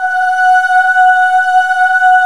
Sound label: OOH VOX S